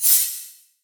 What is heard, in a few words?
anime sword swipe up